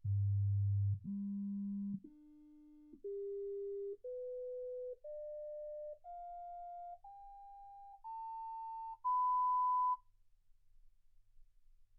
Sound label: sinus tones 48kHz